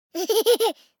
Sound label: BABIES LAUGH Boy 12 months Hehehe; Baby Boom